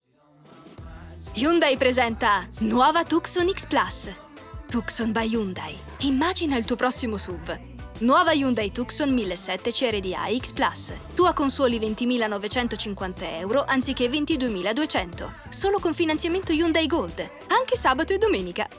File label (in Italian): musica; hunday